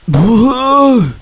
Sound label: hit